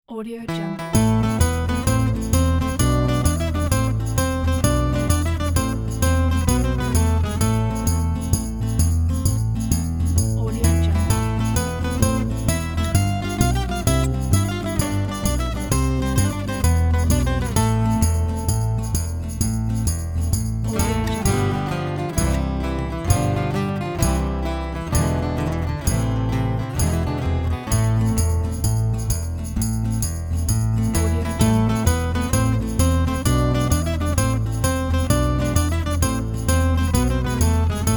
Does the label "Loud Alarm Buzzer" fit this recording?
No